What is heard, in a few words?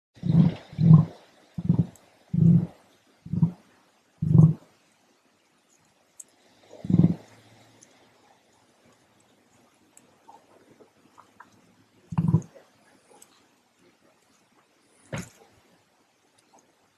male emu call